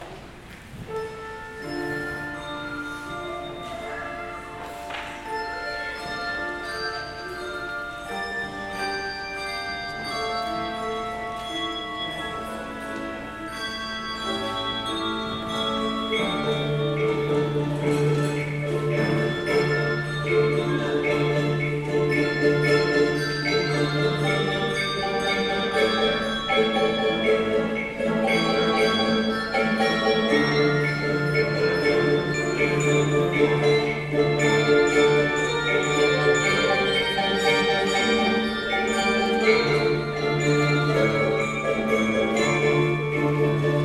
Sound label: Handbells; O Sing to the Lord